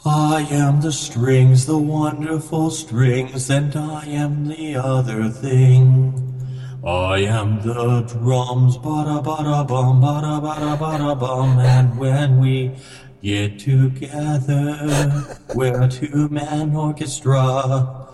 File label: orchestra